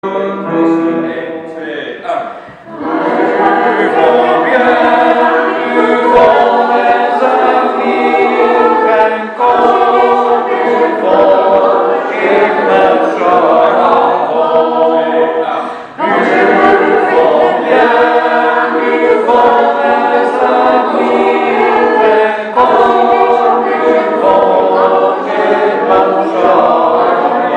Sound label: Tourdion geheel